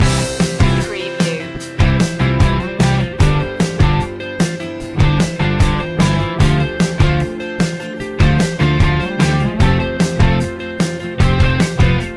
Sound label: active loop